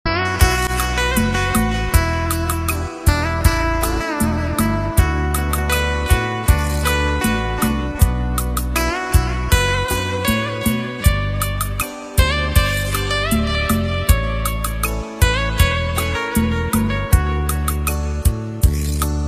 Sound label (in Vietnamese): Nhạc Không Lời Rumba Hòa Tấu Guitar; Nghe Buổi Sáng; Hải Ngoại; Nhạc Chuông